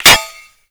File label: impact metal; Modern Warfare